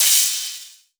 Crash, cymbals; Cymatics; House Starter Pack

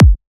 Kick, Psytrance; Kicks

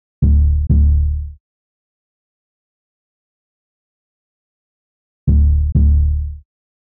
95 BPM D Min Donk; Melody Loops; Loop Stems; Cymatics; Space

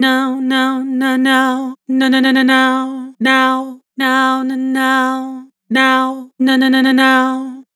Vibrato "Now", Harmor Vocals

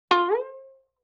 Violin Pluck Pitch Rise

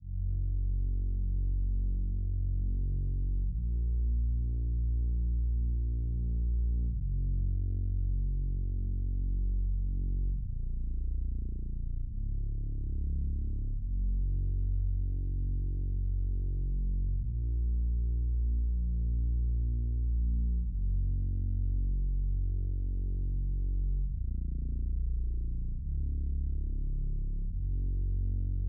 70 BPM A# Min Reese, RnB; Melodies; Loop Stems; Desires

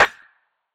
Snare - G; Drum One Shots; Snares; Cymatics; Eternity Sample Pack

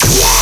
Bass Hit E, Dubstep

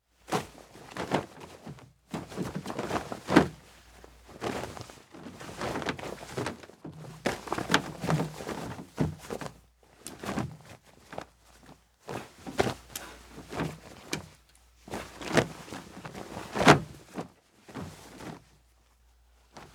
Tarp Flap With Metal BG Car; Fairlight Sound Lib

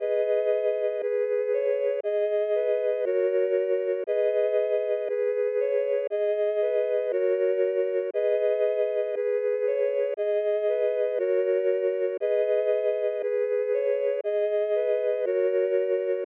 118 BPM G# Min Synth Chords, melody loop stem; Trap; Loop Stems; Cymatics; 2022 Melody Collection - Trap Pt 1; Great Escape

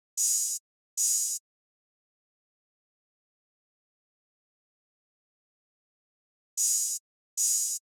Drum Loop 150 BPM Open Hihat; Drum Loops; Loop Stems; Cymatics; Mystery Pack Vol 4; Konopio Drum Loop

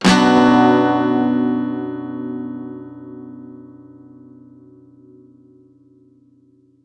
Guitar 128 BPM Bmin, One-Shots; Ghosthack Advent Calendar 2021 - Day 10; Guitar Loops and One-Shots

